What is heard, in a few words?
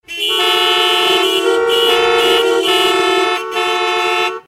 Traffic Jams